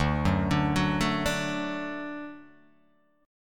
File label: D Major D down, D Chord; D arpeggiated; JGuitar